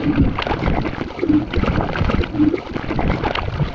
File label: Lava Travel Under Loop; Destruction